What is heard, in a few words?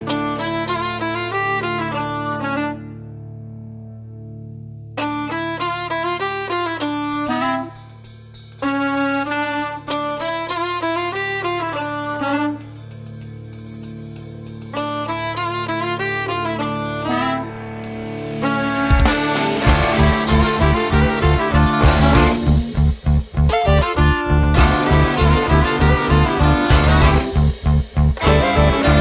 folk